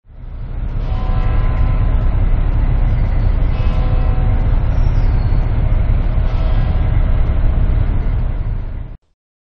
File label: luth bells